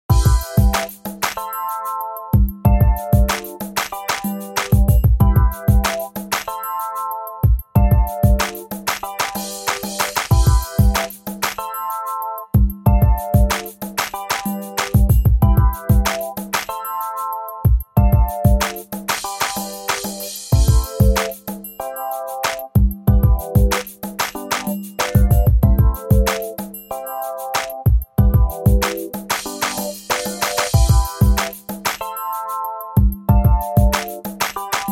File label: Chord progression sample